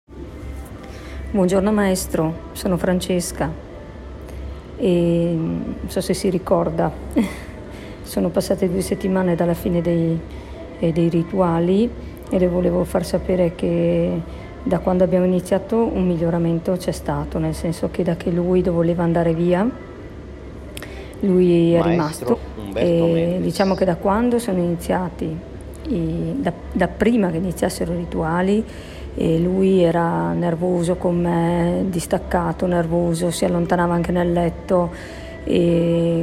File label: logo; zanetti